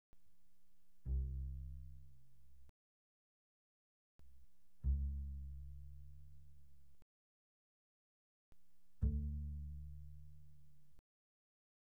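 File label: Bass pizzicato pianissimo sul A C2 B2, doublebass; Strings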